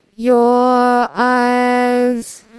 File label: singing